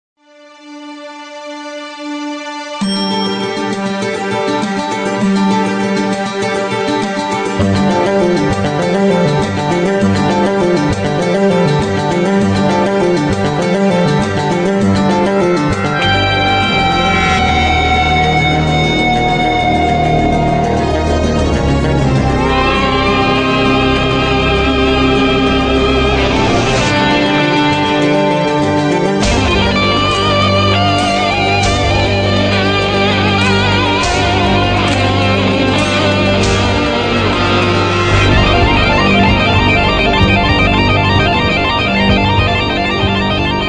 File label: guitar theme music guitar cover; The Walking Dead